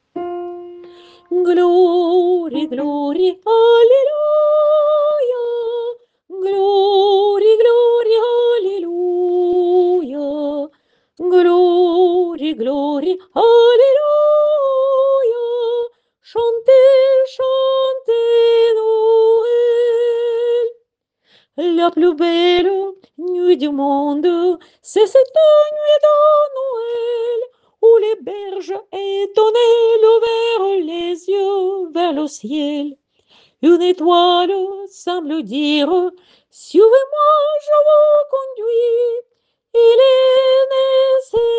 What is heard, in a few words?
Glory alleluia soprano refrain couplet